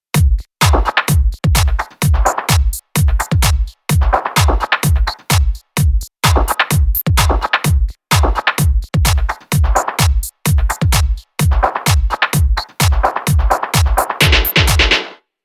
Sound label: house